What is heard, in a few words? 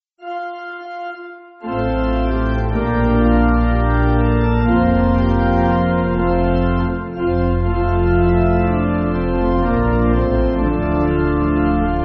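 Pipe Organ, church backing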